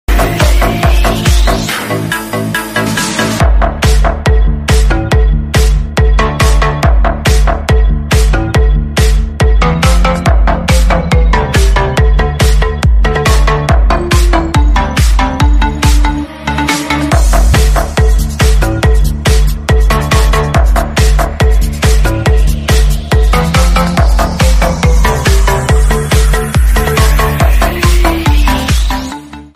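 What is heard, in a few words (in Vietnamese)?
Nhạc chuông nhạc nền điệu nhảy siêu cuốn tiktok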